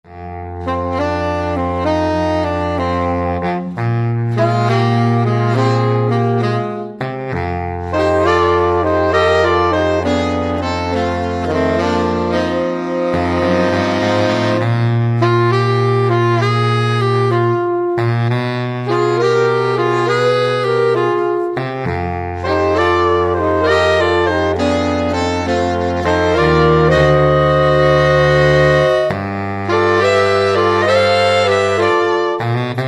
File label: sax